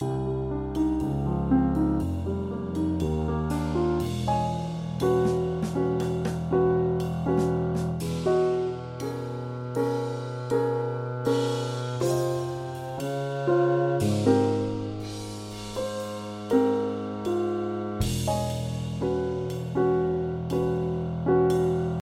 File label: credits ost, Contemplative Fantasy Music; a une prochaine fois